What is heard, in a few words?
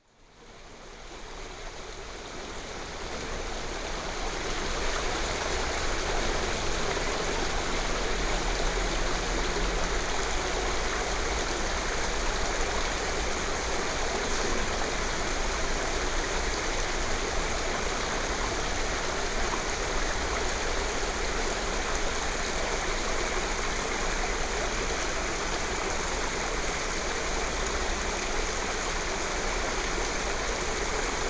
bridge, sergiyev posad; vifansky